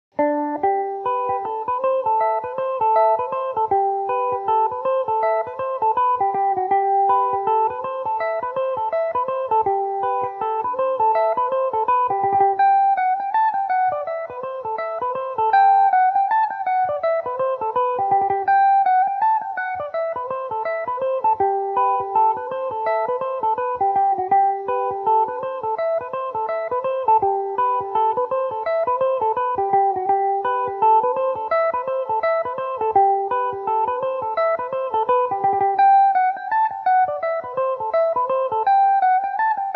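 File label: jolly journeyman G major revelation, mandolin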